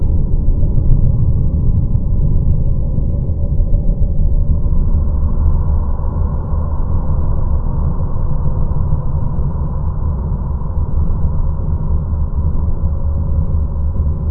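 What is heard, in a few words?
ambience lava cave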